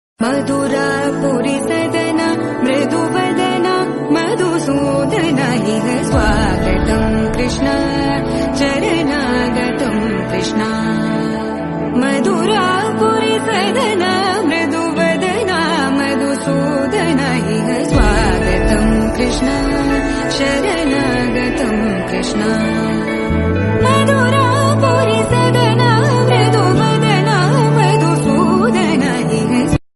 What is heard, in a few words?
devotional